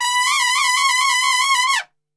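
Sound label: B trumpet shake; Best Service; Brass Super Section; TRP SHAKE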